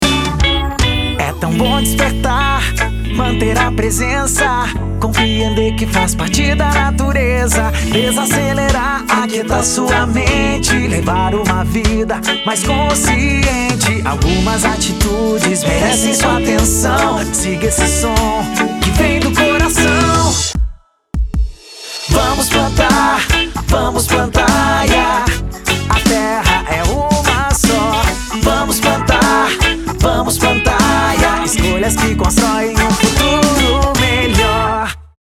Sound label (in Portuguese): Jingle